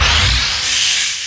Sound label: LARGE FX; USB Soundscan vol.24; AKAI; Industrial Loops